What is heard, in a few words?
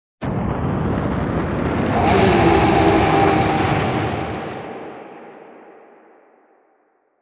Roar of Destruction; Kirby Star Allies